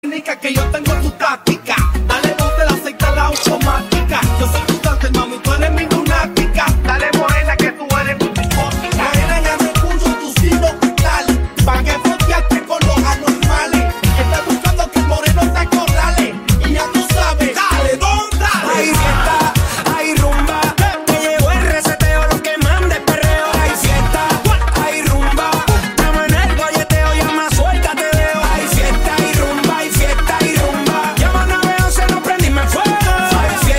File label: Rumba Mashup